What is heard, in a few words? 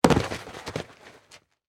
Impact Body Fall In Dirt; Impacts